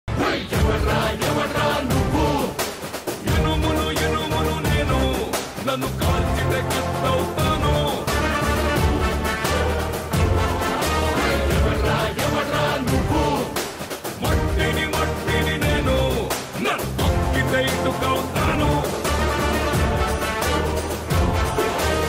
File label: tamil ringtone